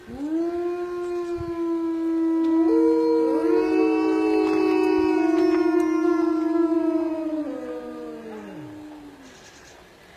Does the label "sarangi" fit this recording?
No